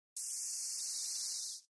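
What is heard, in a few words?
Compressor air out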